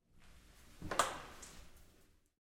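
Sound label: Door Bathroom Stall Open Close Up Light Moves; Lite w-Lock; Door, Bathroom Stall, Open, Cu, Lite w-Lock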